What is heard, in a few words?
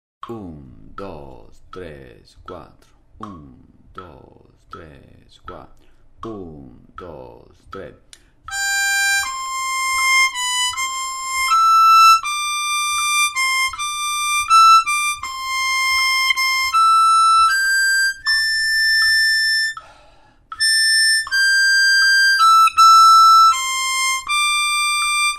Auld Lang Syne 80